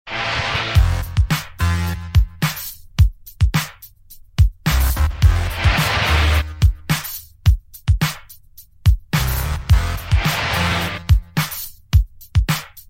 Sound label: Scratch